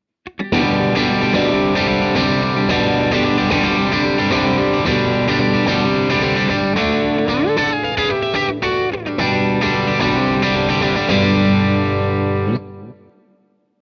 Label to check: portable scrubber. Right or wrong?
Wrong